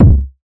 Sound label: fluffy kick drum single shot c sharp key, steel kick drum